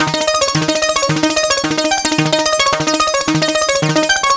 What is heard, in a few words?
Sequence 110 Dm; Sonic Foundry; Hip-Hop Street Beats Construction Kit; SignalsII